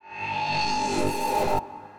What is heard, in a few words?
Synth Sting; musicradar